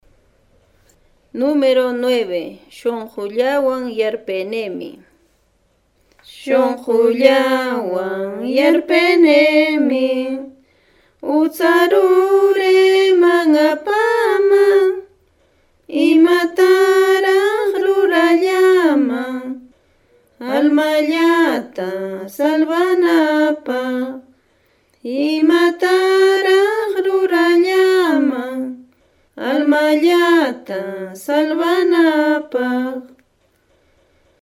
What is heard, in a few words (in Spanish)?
cancion